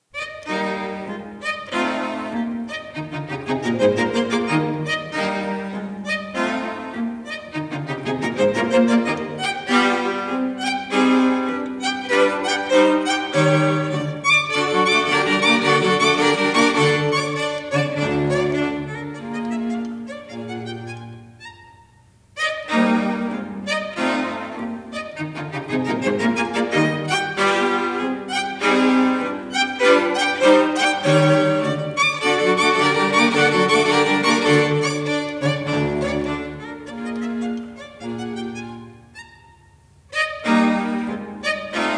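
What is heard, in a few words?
string quartet; Schubert; Konzerthaus Quartet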